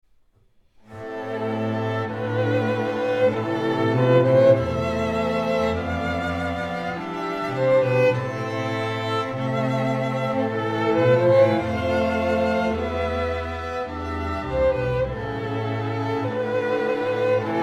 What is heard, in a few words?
sarabande